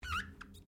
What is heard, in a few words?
Glass Squeak Rubber; Material components for constructing more complex sounds; Materials; Foundation